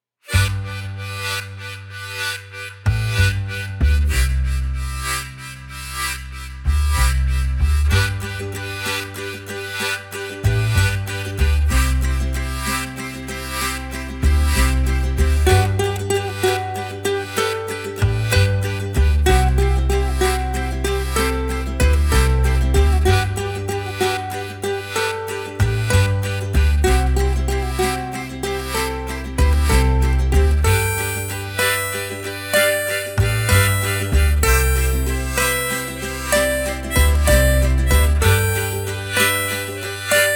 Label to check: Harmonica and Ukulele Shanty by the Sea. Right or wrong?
Right